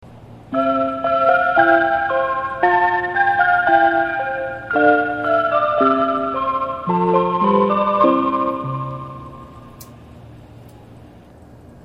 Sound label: chime